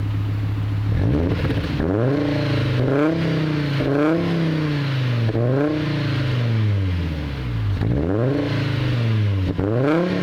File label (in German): 20V Sound aussen stand, sauger; tuning